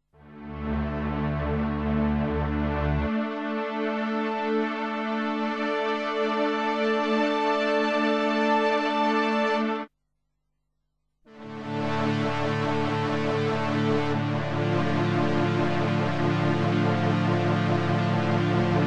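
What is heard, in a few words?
vintage strings; 8x8cc plus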